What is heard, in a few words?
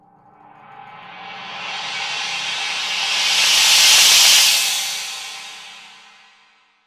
MALLET CYMBL